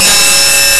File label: Tubul; Nintendo; July 2020 Gigaleak